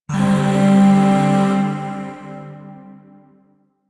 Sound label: Shawzin Pent Min Chord B; Music; Chords; Warframe; Zariman Void Shawzin; DE